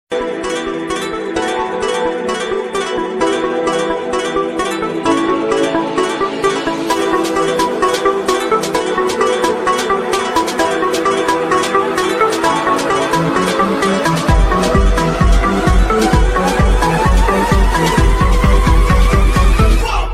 Hacker Ringtone Hacker Bgm Hacker Attitude Ringtone